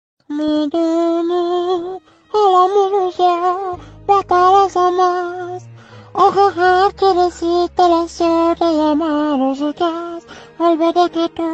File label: Remix